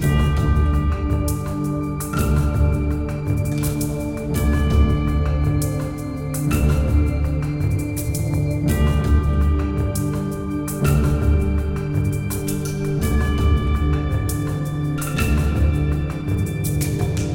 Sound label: ambience Bonus Game; netent; secretofthestones